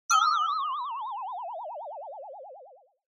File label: Negative High Long; Onoma